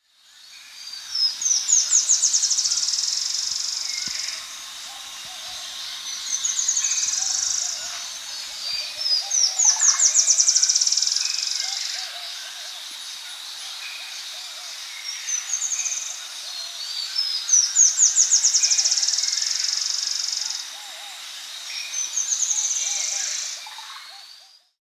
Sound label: Yellow-Rumped Antwren (Terenura Sharpei), Andean Birds; S. America